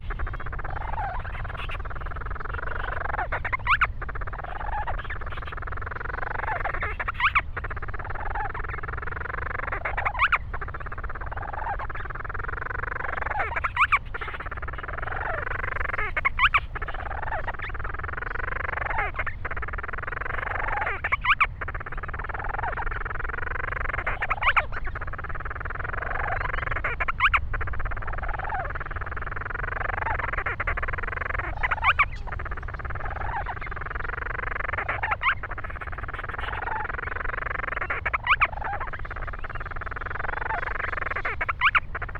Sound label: Grants Storm Petrel